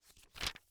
Household Book Hard Cover Book Flip Through Single